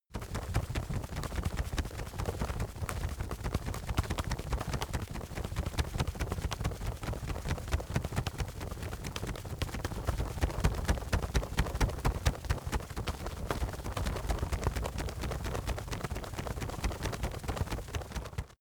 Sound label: wings flap fast